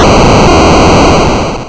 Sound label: Hard PCM Chip; chiptune